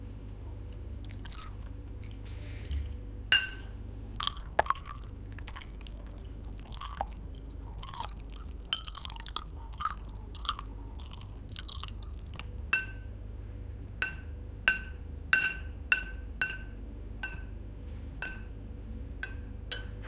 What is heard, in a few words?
uzbek eats plov in chaikh, chewing